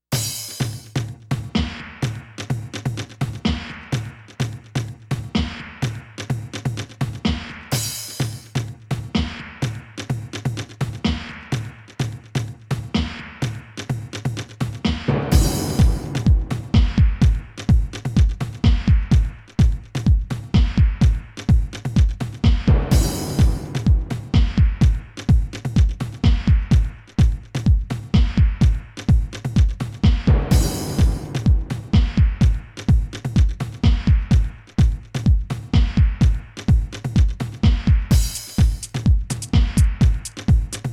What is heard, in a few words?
Tribal Beats